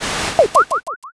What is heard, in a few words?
gacha roll